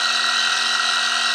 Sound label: machine; 30 cc0 sfx loops